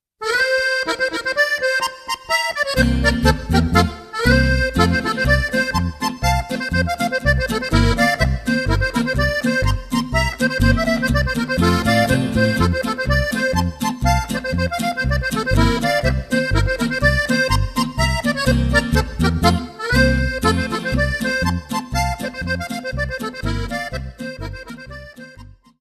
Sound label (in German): polka